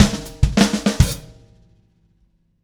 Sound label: Clinical Beat Ending, Indie Rock; Sonic Foundry (Sony Creative Software); Indie Rock Anthems; Session 02 - Clinical